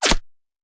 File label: Bullet Impact; Sound Ideas; Guns